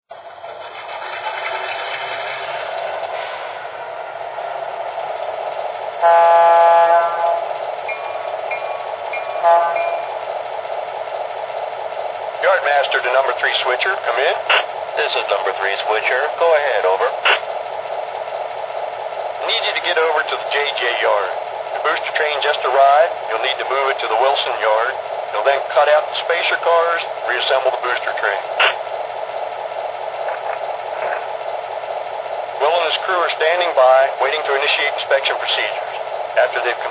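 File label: SW1500, locomotive; nasa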